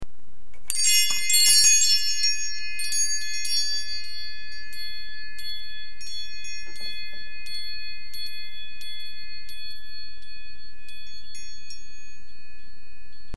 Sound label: chimes